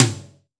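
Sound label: ambient tom, GM drum set; AKAI S-Series CD-ROM Sound Library VOL-8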